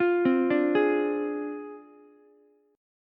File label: D Minor 7th Flat 5th Ukulele; Dm7b5; arpeggiated